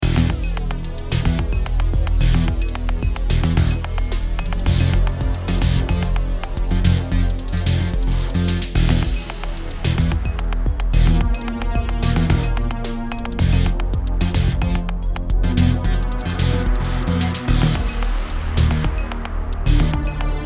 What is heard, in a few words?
Stage 4 -BioLab; BullShot